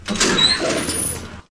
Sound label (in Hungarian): kapcsolo; The Wild Thornberrys Movie